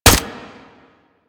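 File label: Primary crossbow Shot; Warframe; Tenno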